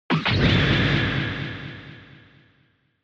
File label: Retro Anime Robot High